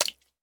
鱼扑腾声

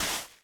可疑的沙子放置声

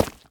凝灰岩砖踩踏声

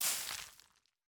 施加骨粉声

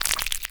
青蛙卵孵化声

